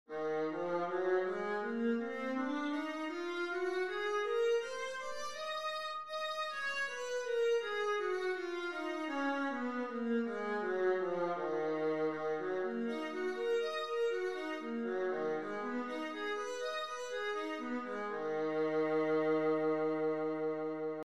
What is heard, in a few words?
viola e flat minor, scales